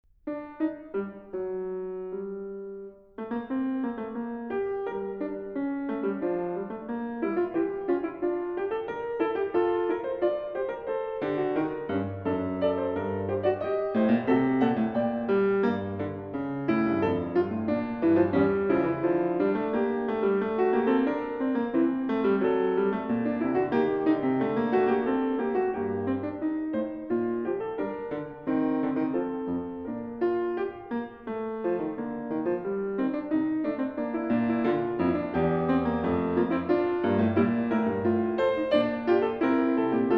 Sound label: Fugue in G minor; BWV 861; The Well-Tempered Clavier, Book 1; Angela Hewitt